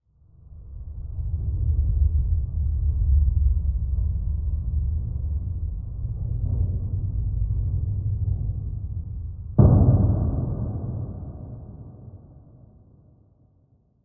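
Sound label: Cargo Bay Door Large Close, doors high tech; Heavy Metal Movement In a Large Space With Impact at End.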